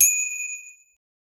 fighting start, boxing

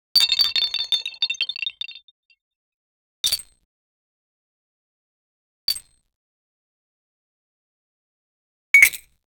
cartoon glass shattering; physics

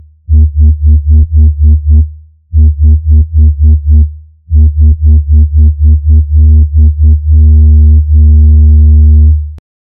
low humming hydraulics

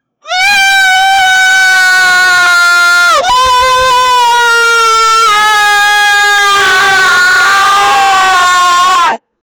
create the sound of hell

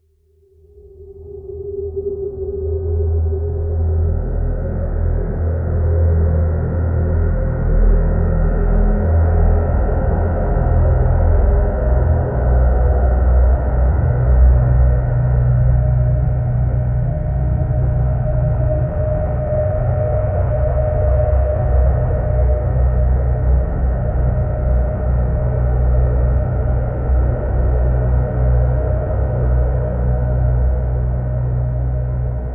a slow ominous dark ambient

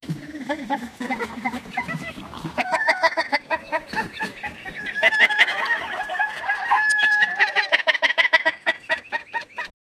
group of animals laughing, chuckling

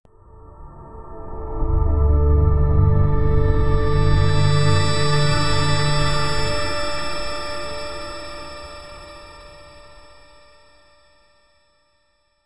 luxury logo reveal sound; antique

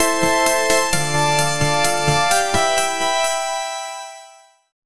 short rising, synthesizer

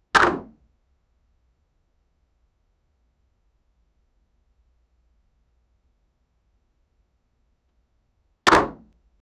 camera

